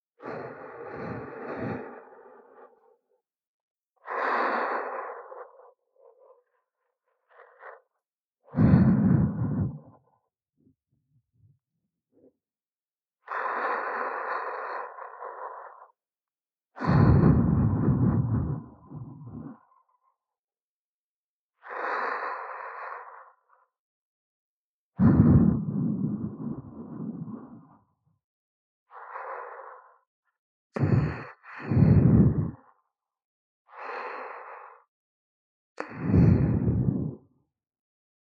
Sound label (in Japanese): 寝息(左); 先輩保健委員の寝息(左); SEあり